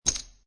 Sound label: Poker Chips Two Chips Dropping On Felt; Gambling